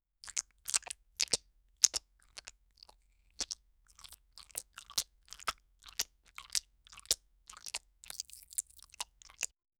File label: chewing the gummy lozenge, chewing